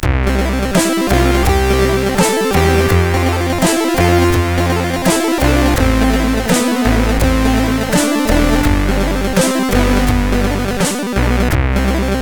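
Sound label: Chord, MOS 8580; sid; CSG8580R5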